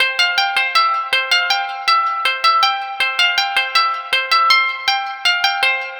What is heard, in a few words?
Koto, ambient